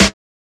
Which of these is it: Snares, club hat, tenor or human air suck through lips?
Snares